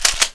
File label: ksg12 after reload